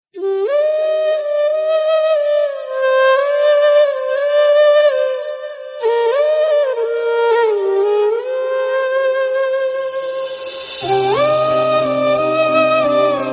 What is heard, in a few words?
Sad Ringtone